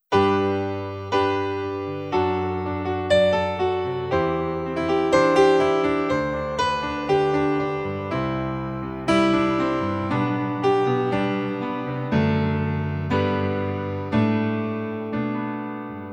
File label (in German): G Dur 60 Bpm